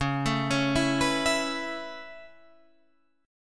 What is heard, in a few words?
Db Minor 7th Sharp 5th Db down Guitar Standard; arpeggiated; JGuitar